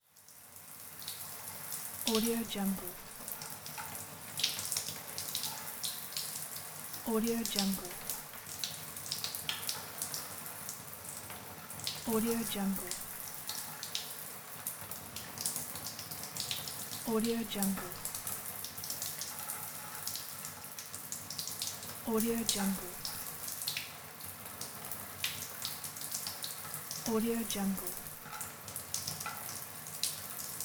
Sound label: shower